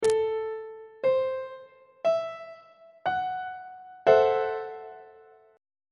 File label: g double sharp minor 6th chord on treble clef